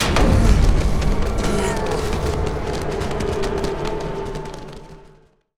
event assembly fight; Stellaris; federations

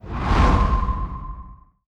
moving out cruiser; Stellaris

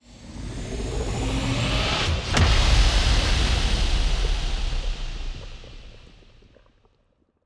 toxic megastructure hit, weapons; Stellaris; toxoids

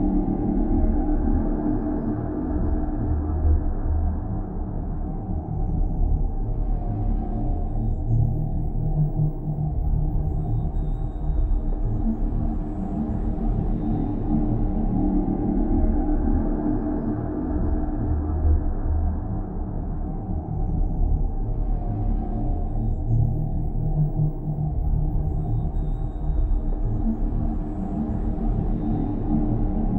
ship habitat idle; Stellaris; machineage

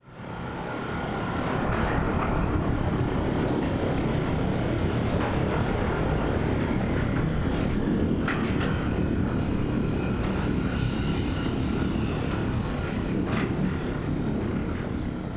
ship colossus closing; Stellaris; machineage

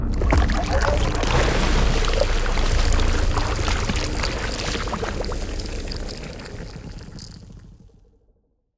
bubbling swamp; Stellaris; strange worlds

